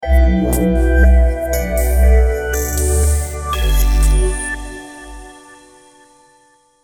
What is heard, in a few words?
gentle design logo